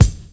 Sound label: BD, BASS DRUM, 70's Breakbeats; USB Soundscan vol.46; AKAI